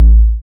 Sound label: BD, Dance Kit, Roland